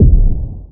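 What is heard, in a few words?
SHIELD HIT; forsaken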